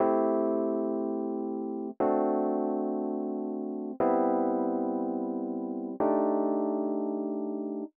120 Rhodes A, Keys, Nu Jazz House; PowerFX

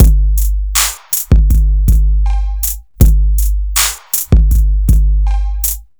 Structured Rhythm, IDM Rhythms

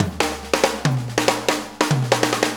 Shuffle Fill, Drum Tools; Sonic Foundry (Sony Creative Software); Loops & Samples Drum Tools; Shuffles; Fills

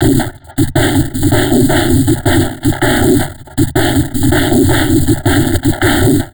160, Drum Loops Crazy Processed

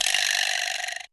Lo Vibra, Alesis D4 Drum Machines

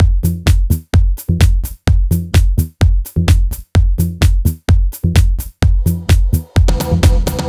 Drum Loop 128BPM Full + Fill

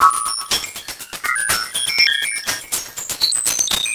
Squelch, Drums & Percussion, Electronic; Sonic Foundry (Sony Creative Software) - Electronic Point Blank

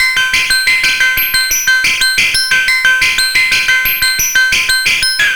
Serge, Synths Arpeggios; Electronic Point Blank

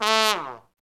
SOLO TRUMPET

